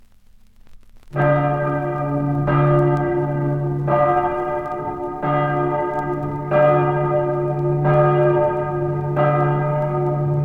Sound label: cathedral bell tolling - horror effect